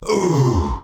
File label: Grunt Hit; paladins; Knight